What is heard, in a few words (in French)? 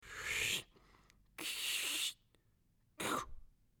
chaise bois tiré; Mobilier